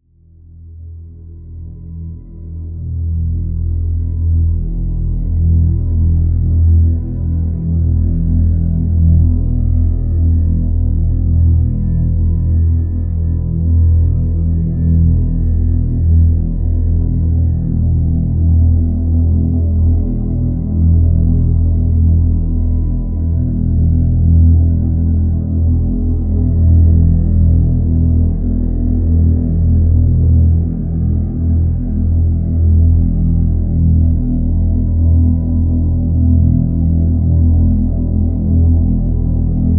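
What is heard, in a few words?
drone E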